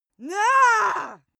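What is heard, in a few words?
female aggressive, screams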